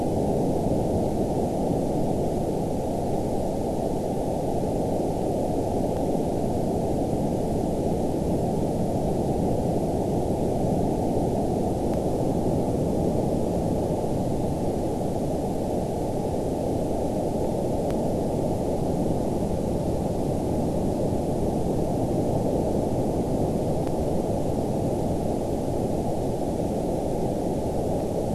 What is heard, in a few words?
WFR audio emfisis